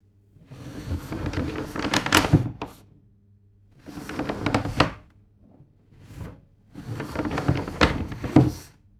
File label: wooden drawer sliding slowly with bumps